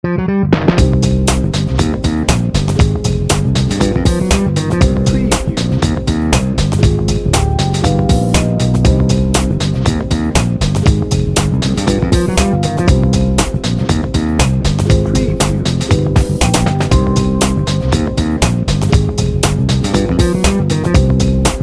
funky junkie bed

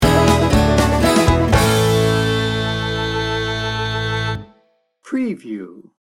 bluegrass and rednecks sting bumper

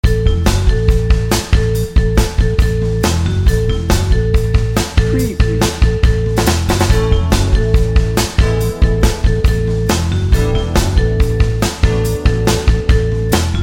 motown metro bed

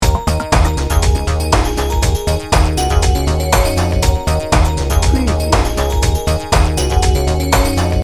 pulsing moonbeams loop

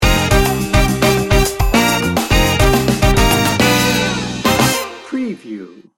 latin carnival sting bumper

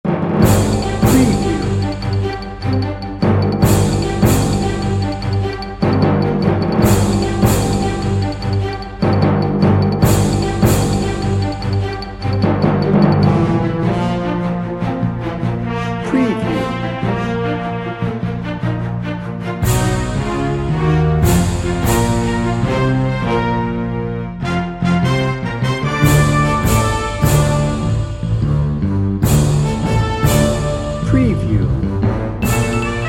anchor news less highs

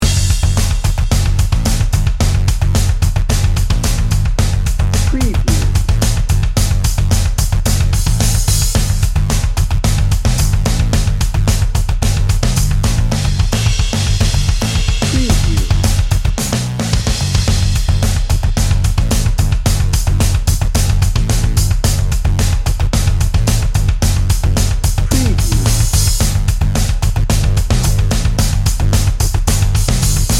metal mayhem

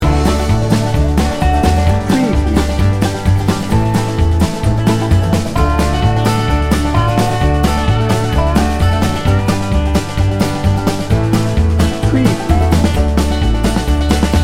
blue collar bluegrass loop

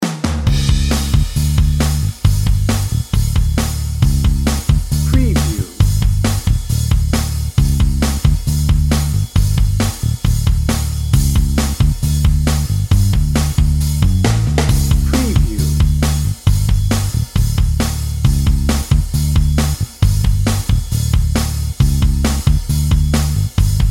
americana

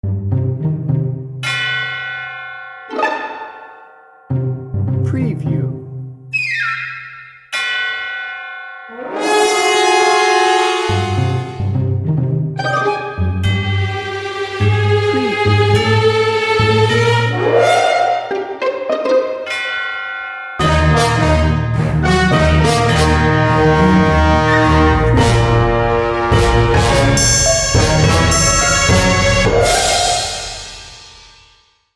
sneaky creepy

